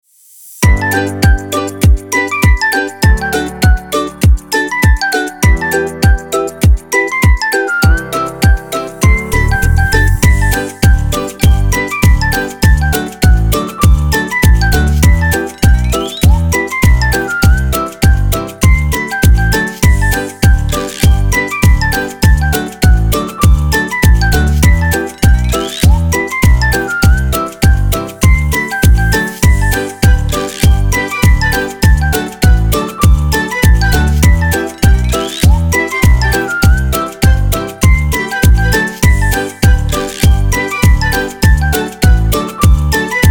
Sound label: children background